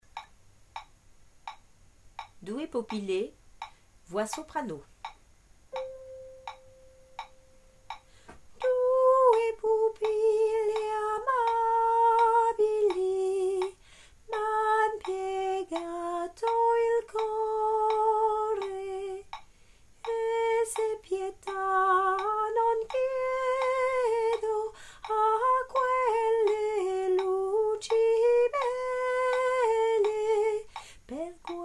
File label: soprano; due pupille